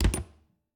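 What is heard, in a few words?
Refrigerator, old fashioned, wooden, steel, brass clasps, hinges, close; Eiravaein Works; Vaeyan IV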